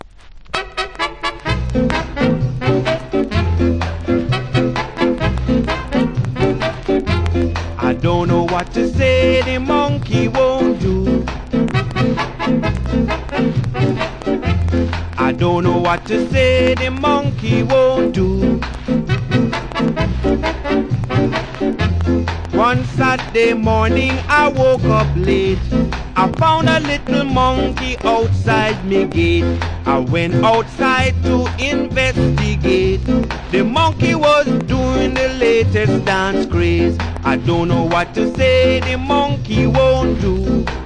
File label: monkey ska